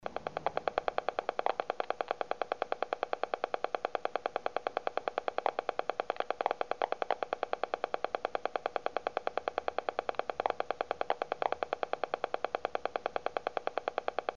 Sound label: WiFi signal